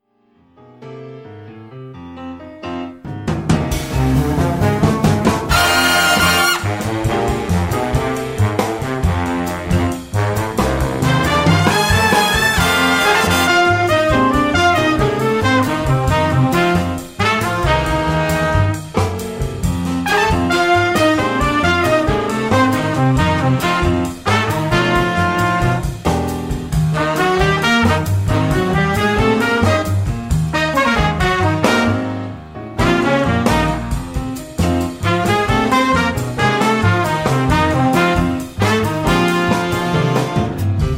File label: NEWJAZZ